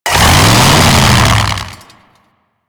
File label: Saw Forward Combo Rev, Melee Weapons; Warframe; Grn Ghoul Saw Player